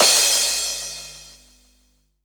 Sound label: CRASH, Dance Mega Drums; Best Service; CRASHES